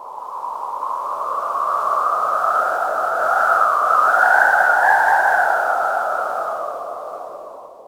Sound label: wind